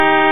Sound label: car horn honk; soundbible